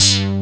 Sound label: FUNKY CUTR, BASSES; Pro-Rec - Synthsonic